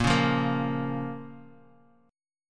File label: A sharp Minor A sharp down; strummed; JGuitar